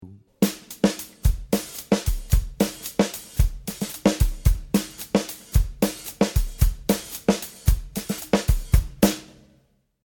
Sample Afoxe Rhythm; CPP Belwin

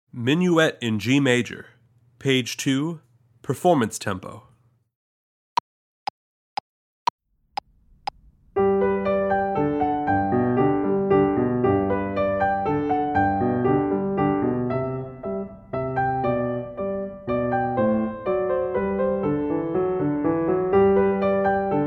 Minuet in G [Performance Tempo], piano

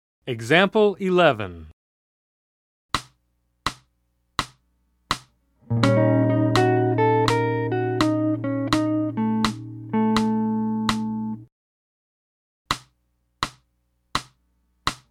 Diminished Lic; alfred publishing

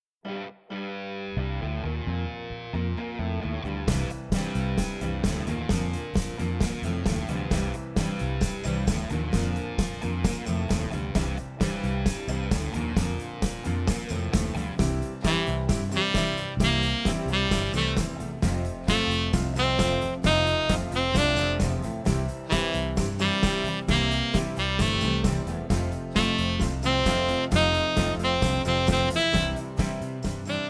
(Tenor Sax)

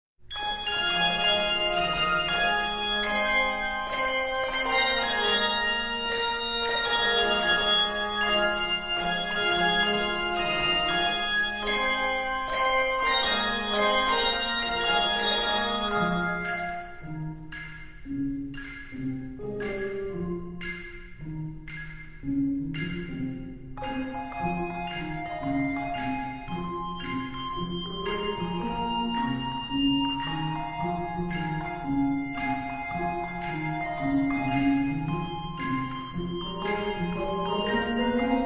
Handbells 4-5 Octave; Didn't My Lord Deliver Daniel; Chorister's Guild; Composer: Geschke, Susan

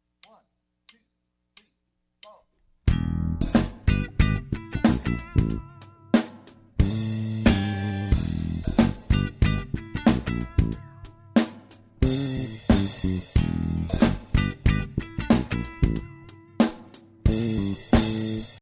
Funk Rhythm, jazz drumset

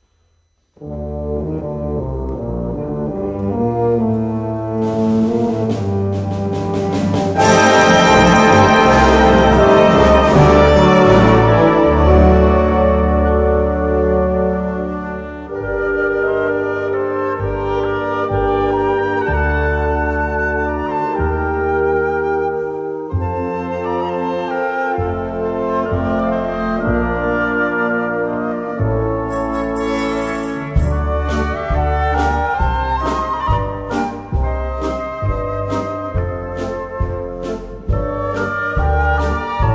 Klezmer Folk Song

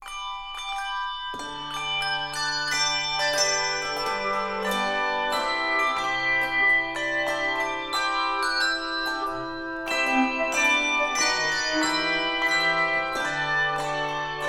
Handbells 3-6 Octave

